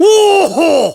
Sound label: Woohoh; Sir Combsly: "Woo-hoh!!"